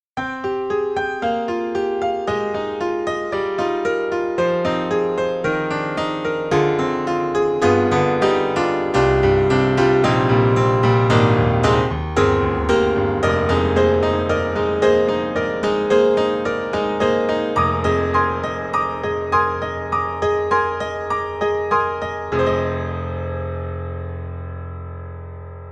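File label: Model D Close, piano; Steinberg The Grand 3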